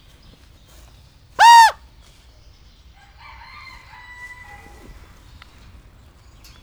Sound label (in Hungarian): egy kiáltás, páva; állathangok; nyíregyházi zoo 2010